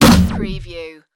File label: wobble voltage overload